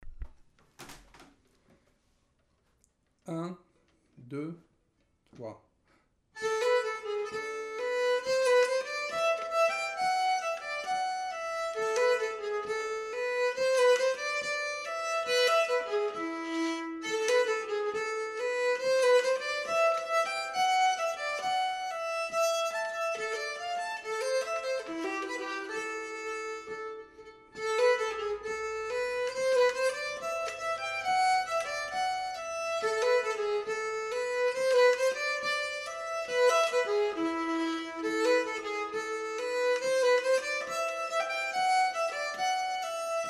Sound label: medelpad polska, nyckelharpa